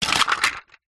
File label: insulation tile pieces drop; foley; zapsplat